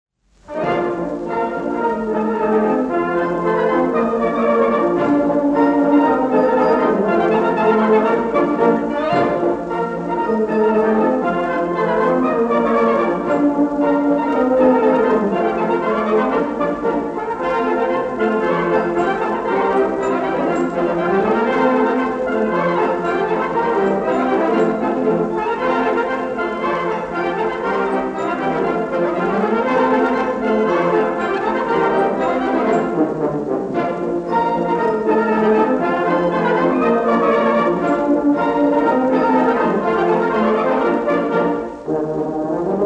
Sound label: polka; Ukrainskaya